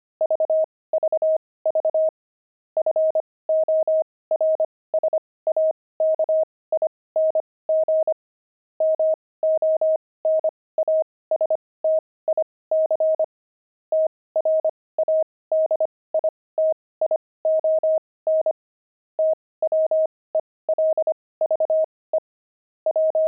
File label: pangram, intermediate pangrams files